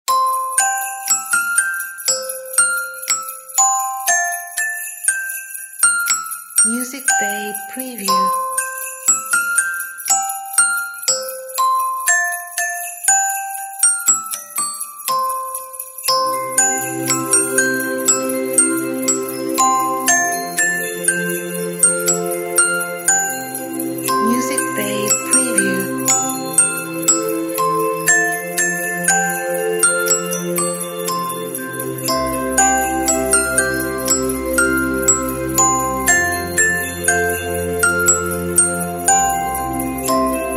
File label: Musicbox Christmas